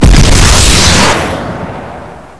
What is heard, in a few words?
rocket fire